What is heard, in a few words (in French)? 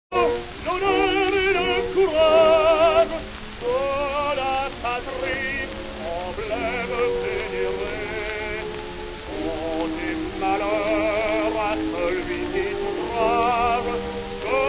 Chant Patriotique; Au drapeau